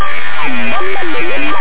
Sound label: computer; Jason Aller Floppy Collection